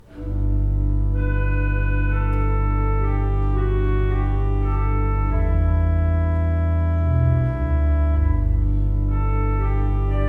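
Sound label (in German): Orgel, pfeifenorgeln; Conacher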